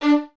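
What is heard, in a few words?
Violins D 4 staccato right; Miroslav Vitous; String Ensembles; 11 Violins; 11 VS Stacc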